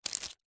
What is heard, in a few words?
foley unopened greetings card in cellophane wrapper pick up; zapsplat